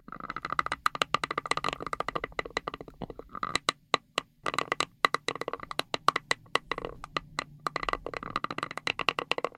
Creepy Teeth; raw game audio files; Mita; MiSide

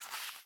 Paper Move; Game rip; MiSide

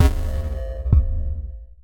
Glitch Bad Player; Game rip; MiSide

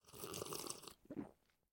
Tea Drink; Game rip; MiSide

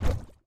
Step Lava; Game rip; MiSide

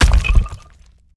Crack; MiSide

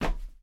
Dance Step; MiSide

